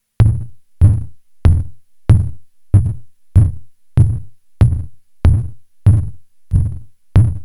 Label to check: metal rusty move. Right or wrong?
Wrong